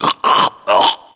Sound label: death, voiceovers; english